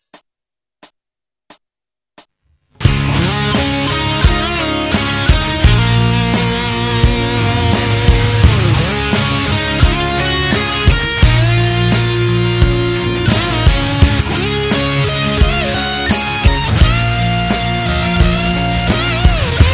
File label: solo